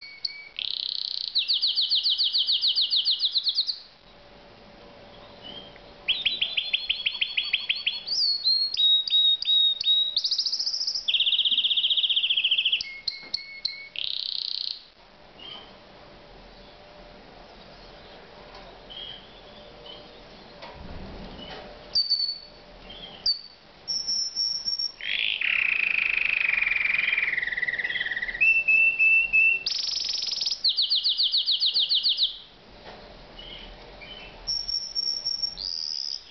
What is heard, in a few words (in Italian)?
Uccello Canarino